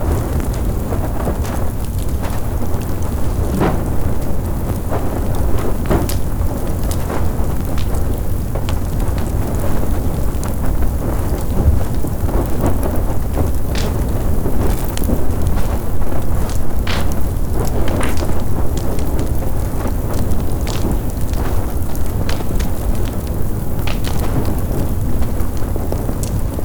INTERNAL HOUSE FIRE, Fire sound effects

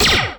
Futuristic Weapons Sound Effects, breach; pgj

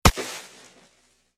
bullet dirt hit; Fire Weapons Sound Effects

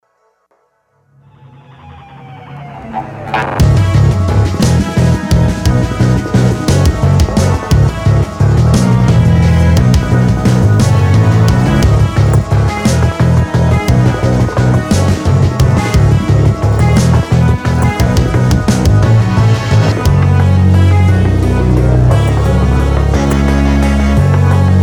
TV Drama

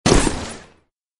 Fire Weapons Sound Effects, blstr

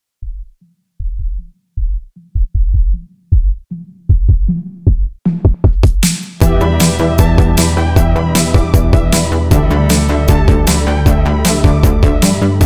Overdrive, Dance

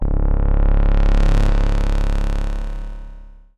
anchor action, magic and spell sound effects

